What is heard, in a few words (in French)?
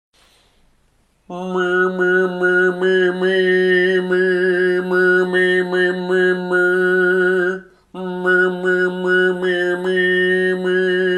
chant diphonique